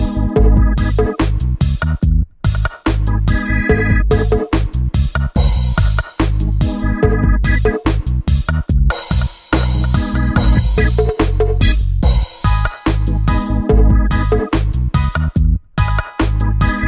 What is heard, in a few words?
Reggae